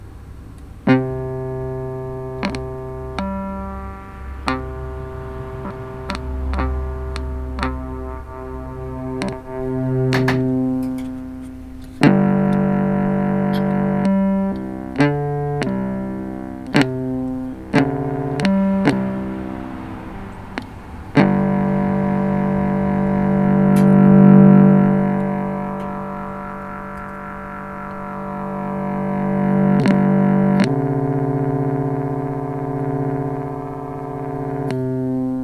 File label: low pitch analog hd